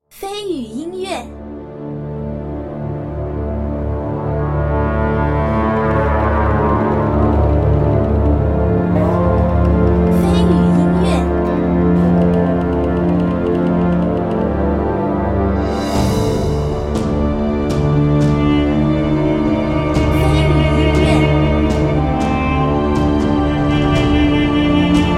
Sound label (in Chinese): 开局